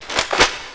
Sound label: weapon pickup